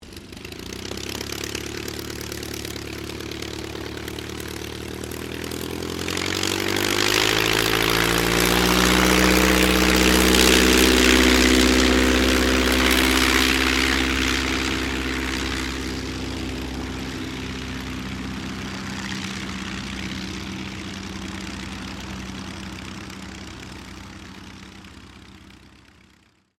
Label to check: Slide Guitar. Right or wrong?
Wrong